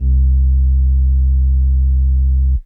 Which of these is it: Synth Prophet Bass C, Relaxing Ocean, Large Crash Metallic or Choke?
Synth Prophet Bass C